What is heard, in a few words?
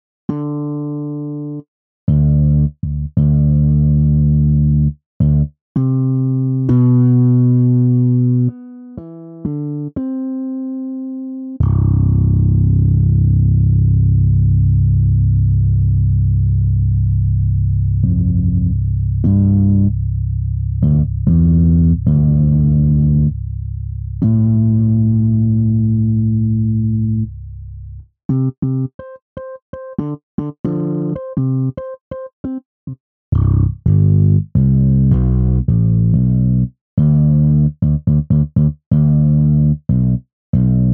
MODO BASS